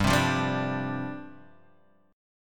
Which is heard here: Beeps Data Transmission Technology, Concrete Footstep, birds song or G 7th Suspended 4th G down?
G 7th Suspended 4th G down